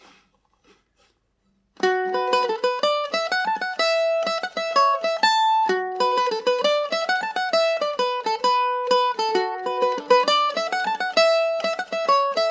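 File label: B minor, irish mandolin; The House Party; G&O #34 mandolin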